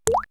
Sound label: Drop, perc fx; X-Static Goldmine